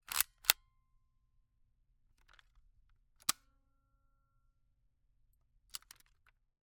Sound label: Camera, Kodak Extramax, pocket, 110 film, plastic, metal, advance film, snapshot, handling; Eiravaein Works; Vaeyan IV